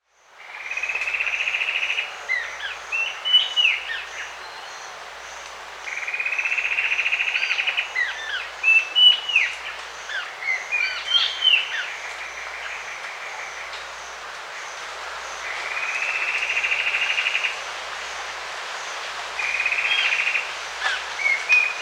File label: Amazonian Streaked Antwren (Myrmotherula multostriata), Bolivia; S. America